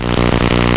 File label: Speed Powerup Short; olpc games; SnowFight